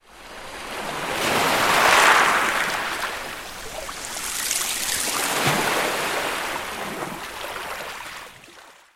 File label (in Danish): NATUR Hav